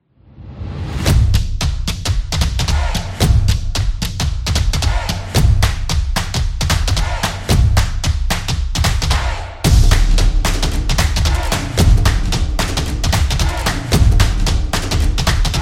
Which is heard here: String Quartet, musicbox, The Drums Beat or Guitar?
The Drums Beat